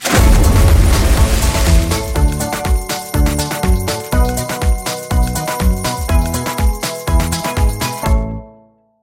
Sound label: Big Win; Dolph Dive